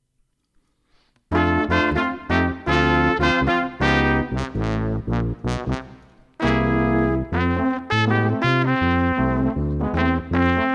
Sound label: Jingle Bells